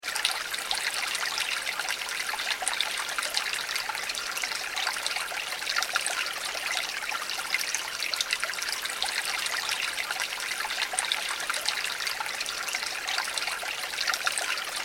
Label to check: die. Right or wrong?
Wrong